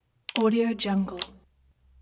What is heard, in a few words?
ethernet connection